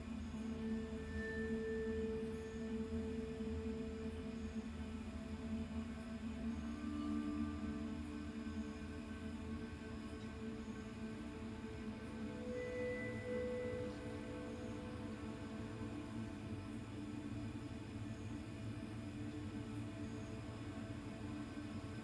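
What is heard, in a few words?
chamber ambience loop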